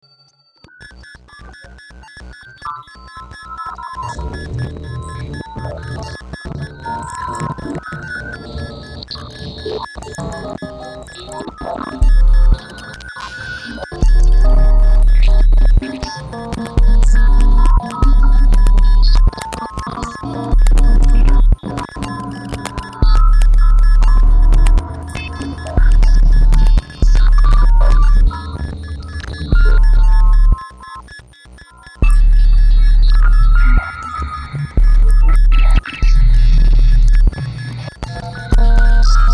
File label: six strings as a noise oscillator; entity